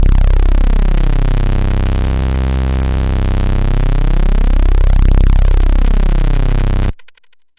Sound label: bright fat synth